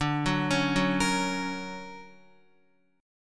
D Augmented Major 7th down Guitar; D+M7 arpeggiated; JGuitar

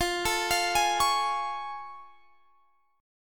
A Augmented Major 7th F

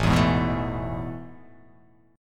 A Major 7th Suspended 2nd, chord

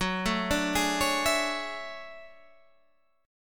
D Major Sharp 11th F sharp, chord

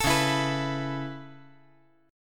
Db Minor 7th Sharp 5th Db down, chord; strummed; JGuitar

